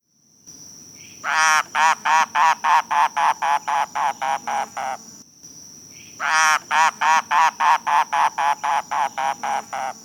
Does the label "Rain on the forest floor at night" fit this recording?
No